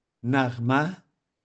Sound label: melody